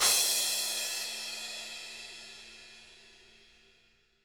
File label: CRASH L